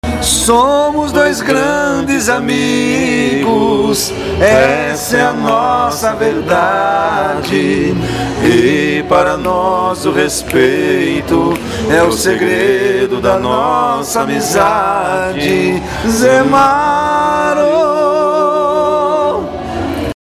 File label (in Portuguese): vinheta longa